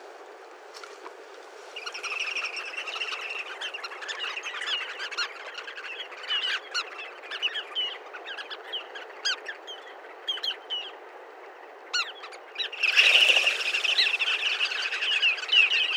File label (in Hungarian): koforgato hangja